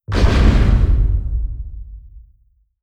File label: distance dragon wing attack; Stellaris; guardians